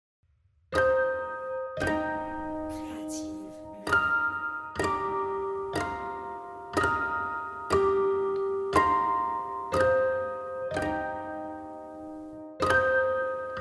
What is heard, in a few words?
piano pizzicato